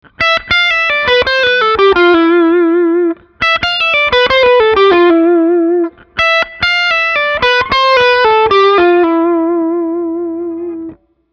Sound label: Full Scale Lick Minor